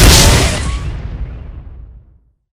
smoker explode, death; source engine; left 4 dead 2

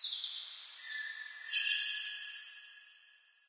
amb african birds; source engine; portal2

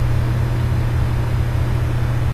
tone; tf; Source Engine; video games; ambient; lair

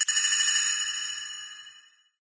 amb random tech; video games; ambient; random abstract; source engine; portal2

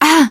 hurt minor, teengirl survivor voice; source engine; left 4 dead 2

